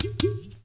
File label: Niger udu; Spectrasonics; Heart of Africa 1